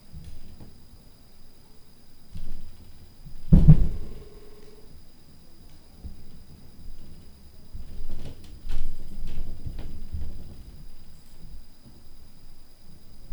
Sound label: Hammer Drop